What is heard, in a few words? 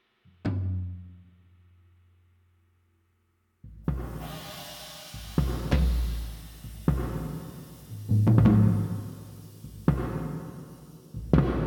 Drum Solo